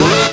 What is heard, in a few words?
synth, a, Rave Synths; Best Service ProSamples vol.37 - Dance Synths